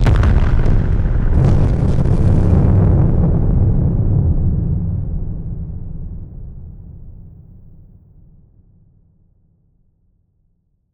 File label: Blast, Explosions